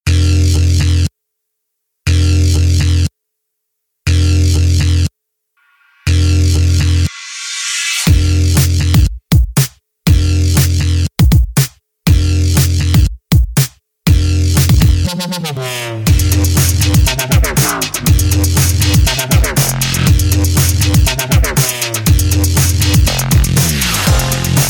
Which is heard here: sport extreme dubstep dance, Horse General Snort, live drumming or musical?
sport extreme dubstep dance